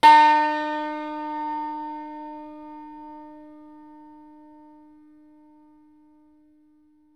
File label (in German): HACKBRETT D#; Best Service; World Colours